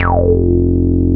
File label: SH101; OLD ROLNDS